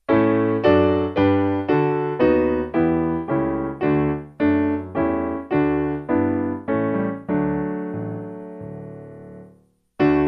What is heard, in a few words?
Piano; StBernard